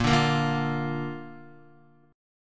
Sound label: Bb Major Bb down Guitar; strummed; JGuitar